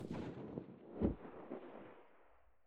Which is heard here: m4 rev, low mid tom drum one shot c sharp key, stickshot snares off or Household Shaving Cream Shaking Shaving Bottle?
m4 rev